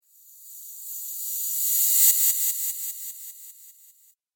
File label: Hiss And Echo Spell; Magic Sound Effects; Role Playing Game